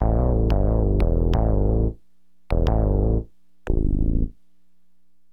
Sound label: BASS, HipHop Bass; LiveAct Pool 1